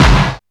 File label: Alesis DM5 Snare; drums